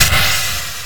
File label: enter water; mazeofgalious